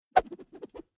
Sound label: Whoosh Swish Sweep By Long Bamboo Cane High Pitch Swish Whip Wobble; Tom Hutchings